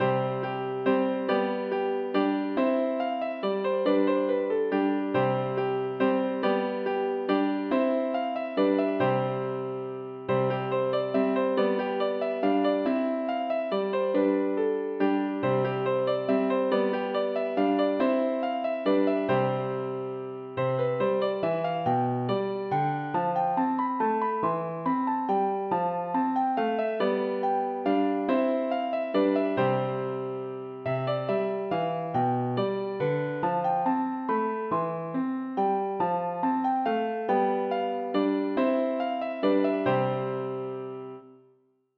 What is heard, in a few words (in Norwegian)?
Menuet for piano; Aamodt